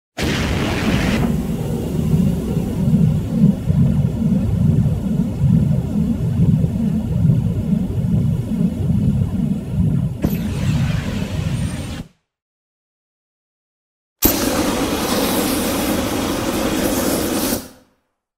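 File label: flamethrower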